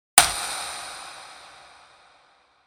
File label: ping pong smash